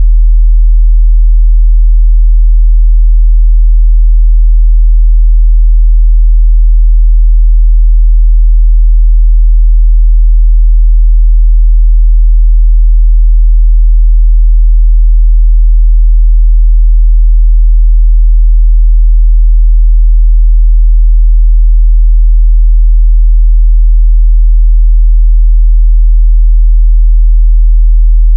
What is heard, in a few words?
40Hz; 192k; 24bit